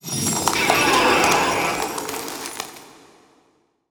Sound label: crystal, lithoids; lithoid portrait; Stellaris